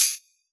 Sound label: Tamb, Yamaha DJX